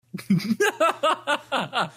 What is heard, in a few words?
laugh; assassinm4a1